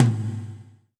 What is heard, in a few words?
Percussion